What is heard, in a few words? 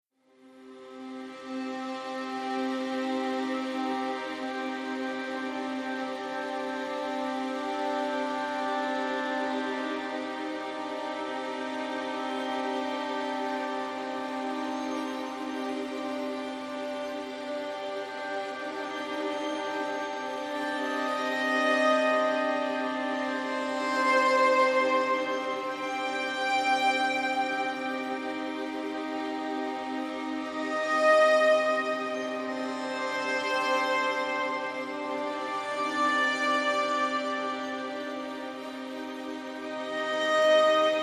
Haunting Strings